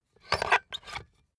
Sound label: puncher squeak punch bulky slow